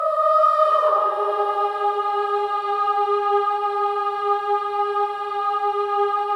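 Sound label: Voices, INTERVALS, 5TH DOWN; Spectrasonics; Symphony of Voices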